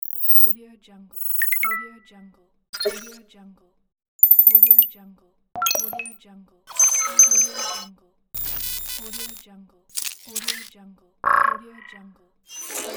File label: hud elements electronic sfx